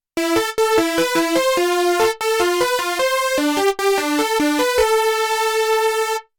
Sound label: osc lfo filter env drive; Lead-Sound erstellen am Synthesizer